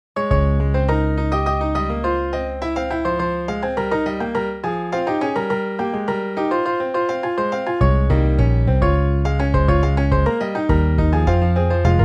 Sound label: Piano Bass MIDI